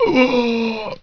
zombie

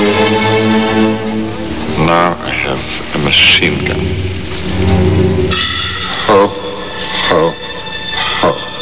hohoho

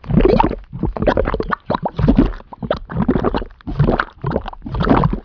lava bubbling

